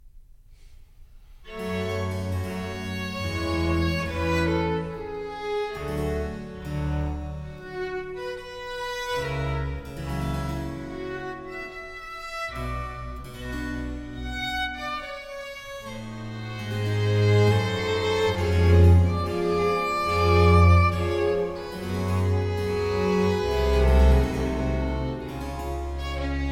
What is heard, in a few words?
concerto for cello strings and basso continuo in b flat major; II. Adagio